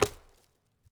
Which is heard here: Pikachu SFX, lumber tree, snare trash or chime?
lumber tree